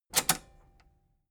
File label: metal button robust spring click enable fast